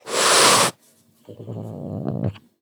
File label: Angry Cat Hisses; افکت صدا حیوانات; Animals